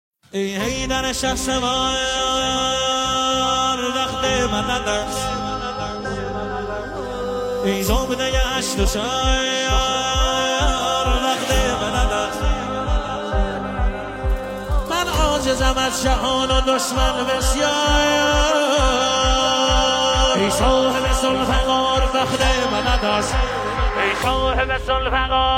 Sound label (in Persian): نماهنگ